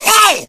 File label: smoker attack, voice; Left 4 Dead 2